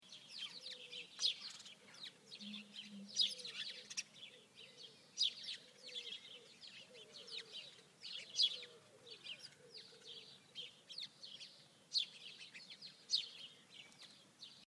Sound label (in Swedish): kvitter; svalof